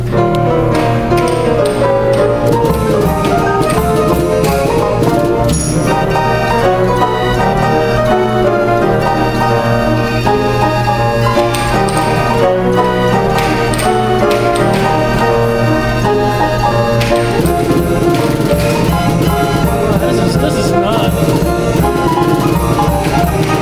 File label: 100 year old music machine